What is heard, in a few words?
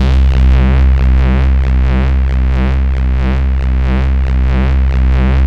Wobbly Reece, Basses; AMG - Prototype Drum & Bass